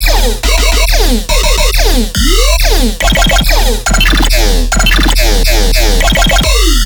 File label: Riddim Bass Loop - D# 140; Sniper; Ghosthack; Riddim Essentials Volume 2